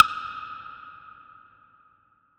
Cavern Perc; trap; Percussion; Cymatics; Trap Starter Pack

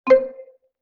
Xylophone Flam C; Vintage Cartoon; Musical Elements

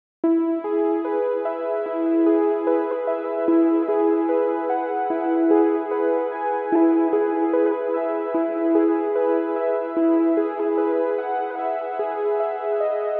74 BPM C# Min Saw Synth; Melody Loops; Amber Eyes; Cymatics; ANALOG EVOLUTION Beta; Lofi Collection

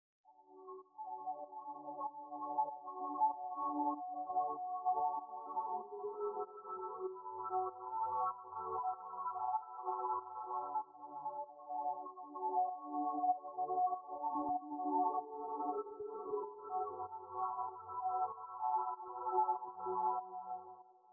96 BPM F# Min Pad High; Melody; RnB; Loop Stems; Cymatics; 2022 Melody Collection - RnB Pt 1; Break Away

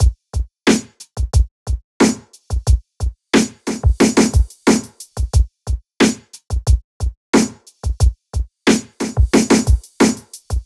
Full Drum Loop 90 BPM; Drums; Humble Hip Hop Sample Pack; Berry

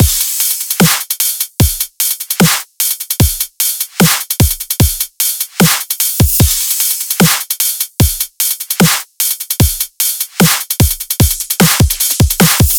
Full Drum Loop 150 BPM, Dubstep; Drums; Loops - Full; Detonate

